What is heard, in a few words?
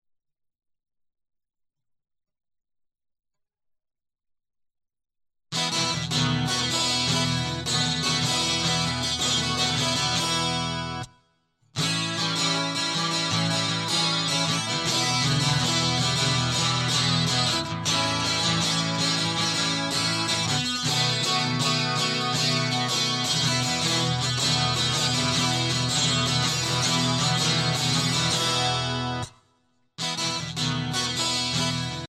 Guitar Loop